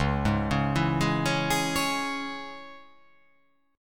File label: C sharp Minor, chord